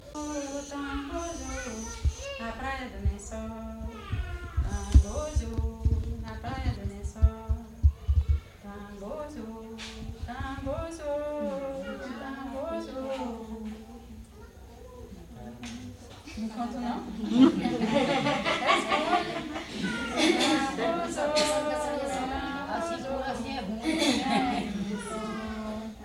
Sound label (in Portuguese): tenda sao jose tambor